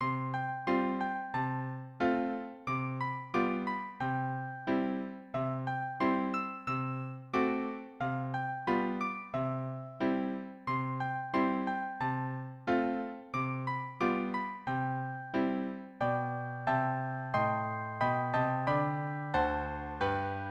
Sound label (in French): Les cloches de Corneville Baryton; PLANQUETTE